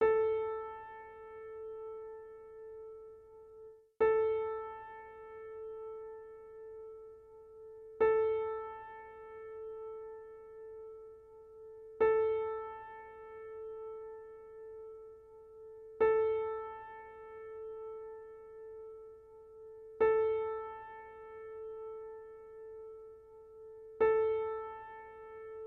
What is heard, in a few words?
piano A